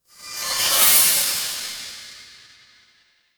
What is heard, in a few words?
magic scifi spell